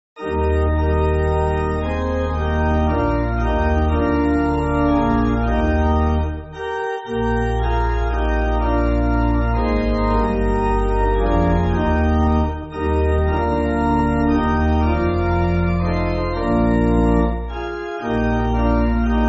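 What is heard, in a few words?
Pipe Organ, Free Music Backing Files for Churches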